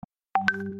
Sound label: chat new message